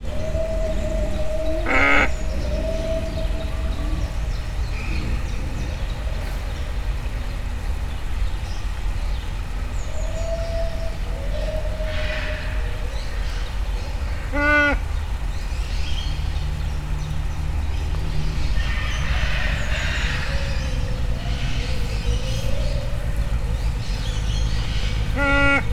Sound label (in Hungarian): balról fehérkezű gibbon, jobbról pusztai sas, parlagi sas; debreceni zoo 2012